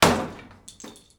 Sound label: Piano Destroyed demolished broken Wurlitzer Upright Impact string note hit ringing out; pieces; Matt Script; pieces fallin